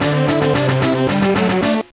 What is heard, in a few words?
(Jingle) Race Victory; rayman raving rabbids gba gamerip 2006